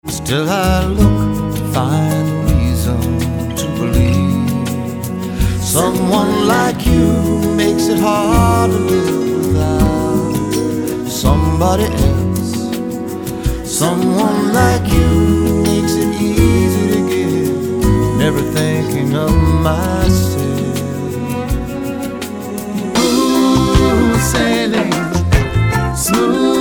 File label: Pop Medley